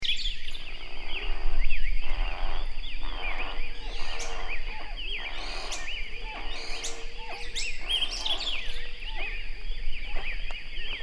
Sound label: White winged guan